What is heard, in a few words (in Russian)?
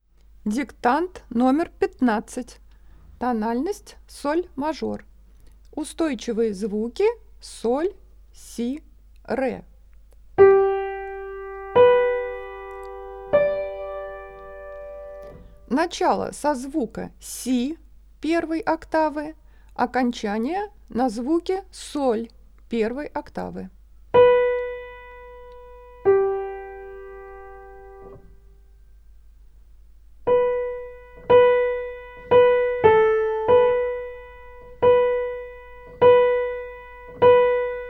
Соль-мажор